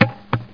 TIRE; CD Action

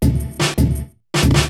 DRUMLOOP D+B 160, Drum+Bass Loop; eJay

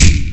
gun

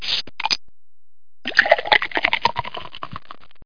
Rattle

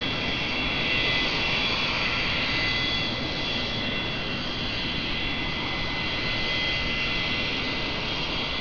AIRJET

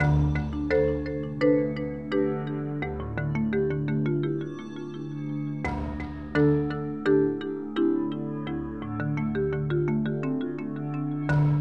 spook Loop; SGI O2 Out of Box Experience 2.2

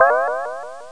dringing

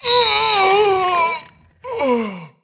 half ogre female die; DAoC; Toa; Gamestar

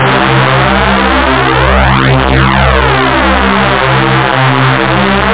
synth chords; OctaMED SoundStudio V1

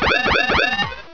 Warning; spyemergency

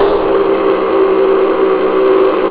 aaahhh, scratch

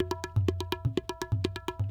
PERC LOOP ETHNO 125

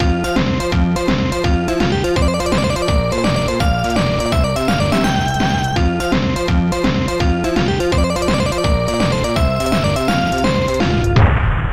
tune; BlitzBombers; Amiga

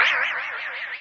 bell

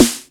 snare

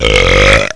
belch; Multimedia Toolkit

